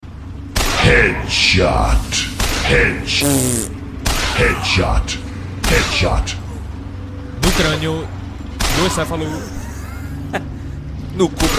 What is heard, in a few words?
headshot